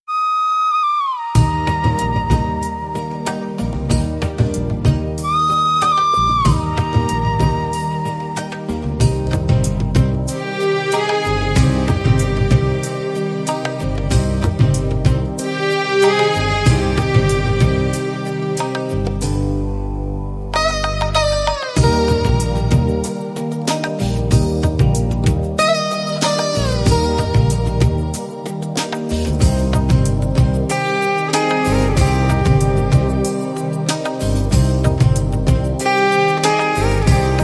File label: free ring tone, indian melody